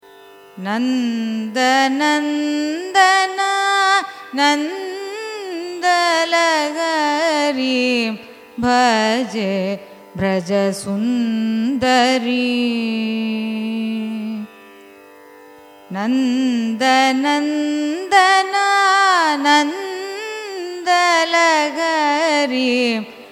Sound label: raga desh carnatic